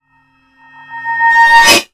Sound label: Swell Metallic; bottlerocket